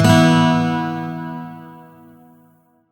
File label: Shawzin Chromatic Chord H; Warframe; Lotus Shawzin